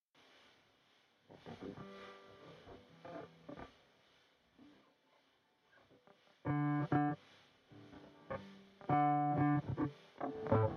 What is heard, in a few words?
Dm96 Bluesy Groove in Five Four